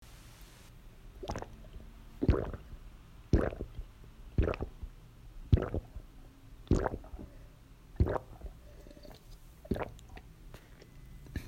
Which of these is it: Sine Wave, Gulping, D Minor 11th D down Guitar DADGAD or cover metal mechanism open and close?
Gulping